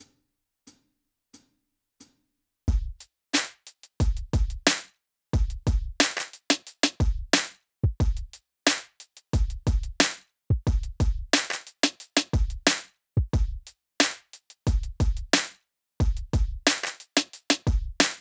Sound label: basic slap 90bpm